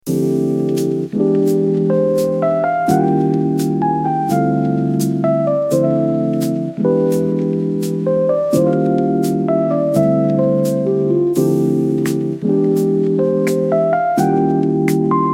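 spokoynaya fonovaya muzyka lofi hip hop, calm background music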